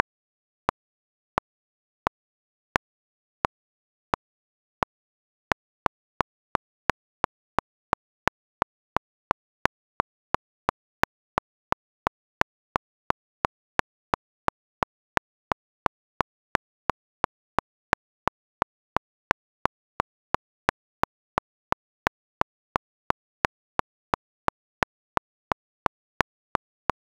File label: Click Only; Kaiju; kaiju soundscape